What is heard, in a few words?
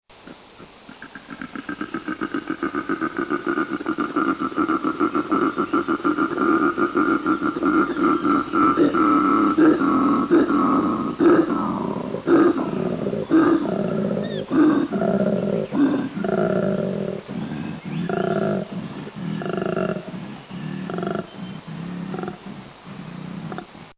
koala